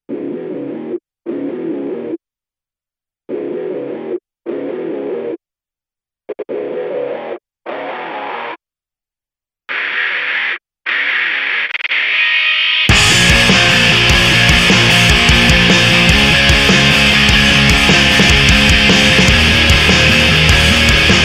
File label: loud overture free bgm